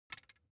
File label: object metal thin boing vibrate short; smartsoundfx